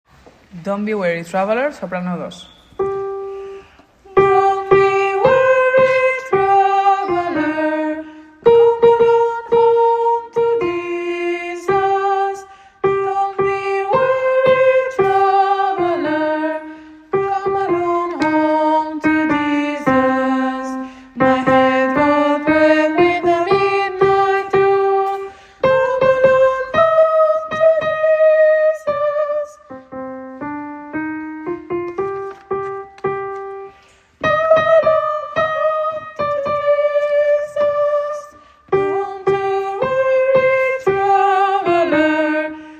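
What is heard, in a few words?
soprano 2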